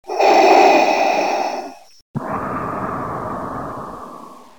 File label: Vader Breath